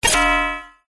science fiction computer error beep tone; zapsplat

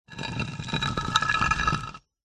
foley heavy stone or rock scrape drag short

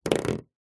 household door wooden creak short

